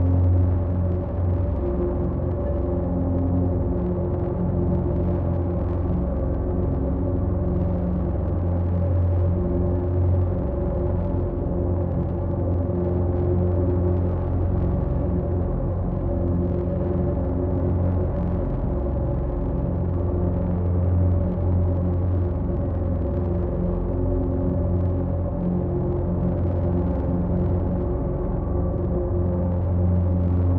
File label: psi ship titan idle; ships; Stellaris; shroud